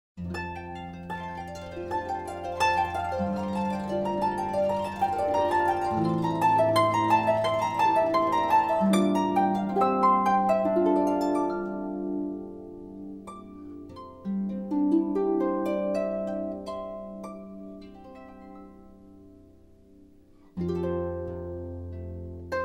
Instrumental Harp Praise

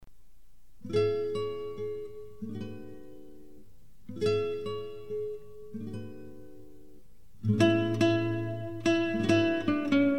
Guitar

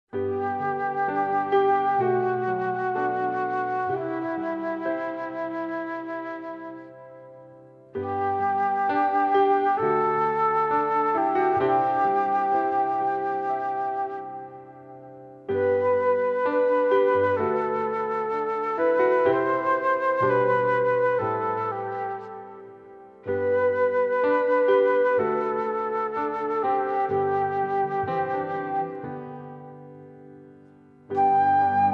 acoustic praise, instrumental

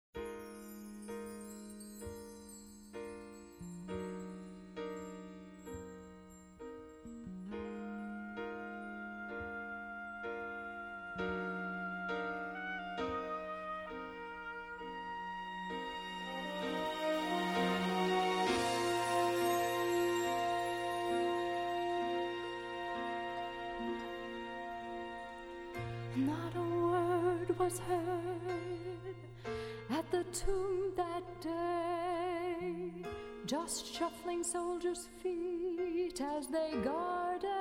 Instrumental Praise

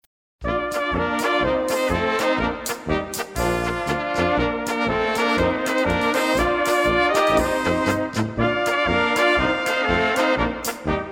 Instrumental

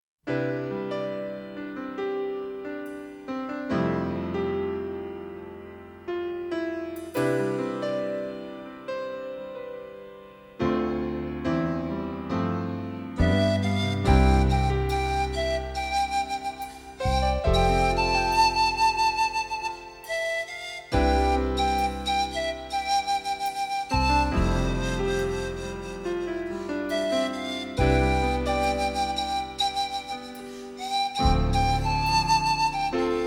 Flute

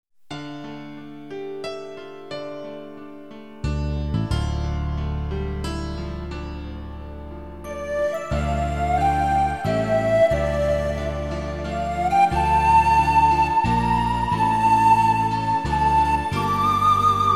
Instrumental

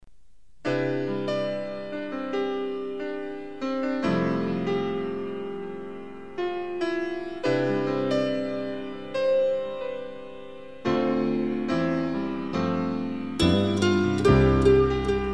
guitar

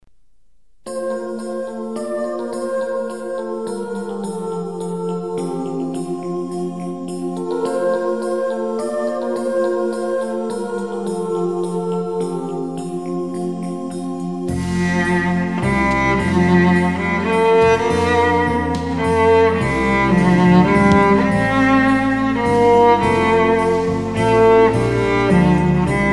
Cello

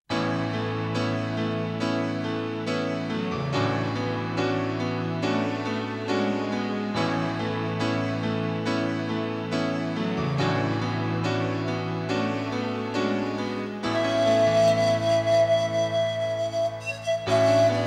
Flute

